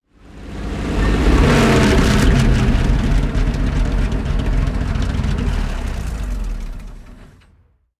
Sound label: panther engine stop, tanks; War Thunder